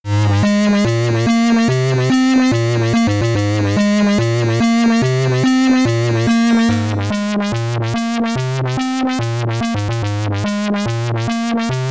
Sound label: High Score, SID Stereo; terra cresta commodore 64